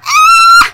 悲鳴; 音MAD; Cookie

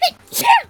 （くしゃみ）

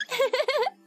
笑（かわいい）

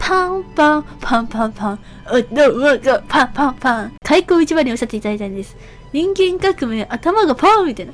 頭がパーン！のグルメレース（アカペラ）; 音MAD; Cookie

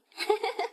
笑い

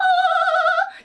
アァ～♪（ビブラート）

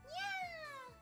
にゃーん！

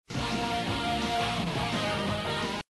ギターを演奏する; ドナルド

